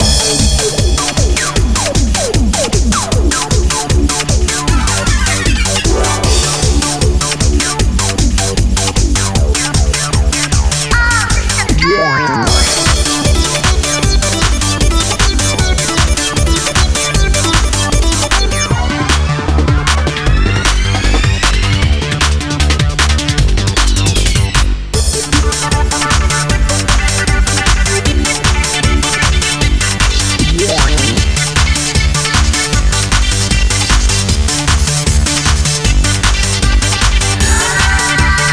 default game music; EyeToy Play Astro Zoo; PlayStation 2

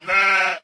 Sheep Man Idle

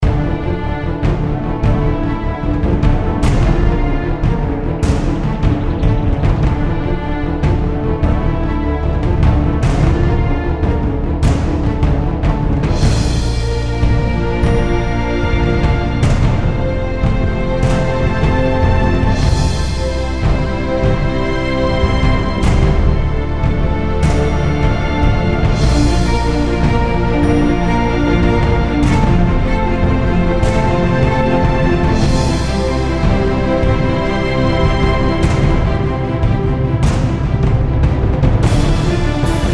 Main Menu BGM

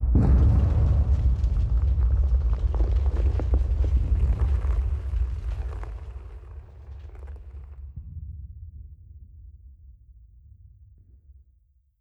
Dynamite Explosion; Adam's Venture Origins

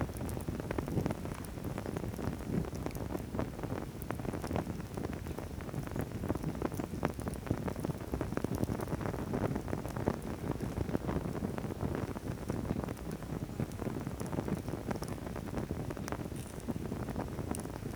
light torch; teardown ps5 windows xbox series xs gamerip 2022

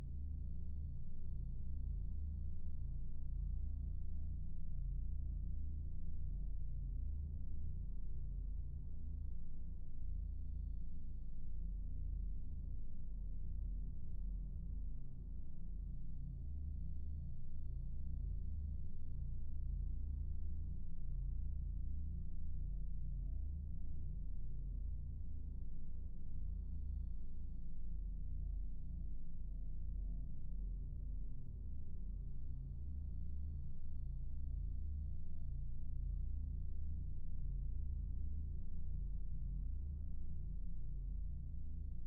env base Indoor

